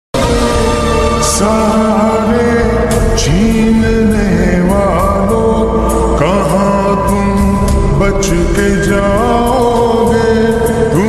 ringtone best islamic ringtone arabic ringtone urdu; naat